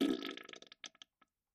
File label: Bowl Fart Wet; farts